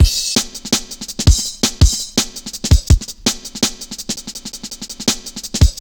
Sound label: Virtual, Drum Loops, 165.5, Jungle; Zero-G Jungle Warfare 1, 2 & 3